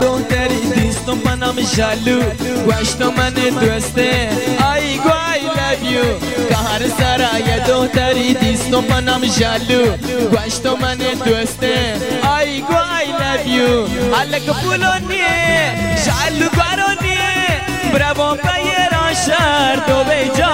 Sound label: Baloch ringtone